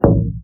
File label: glass footstep; everness